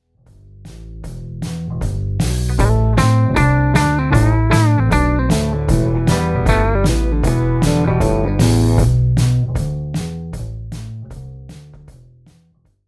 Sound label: The Turnaround, blues, guitar; guitarist presents blues